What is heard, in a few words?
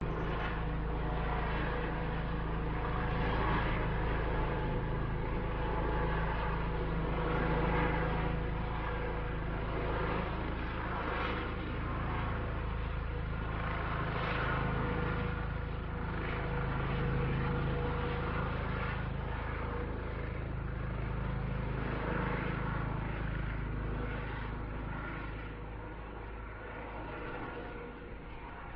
police helicoptor newcastle upon tyne uk